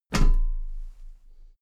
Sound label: step metal ladder step top regular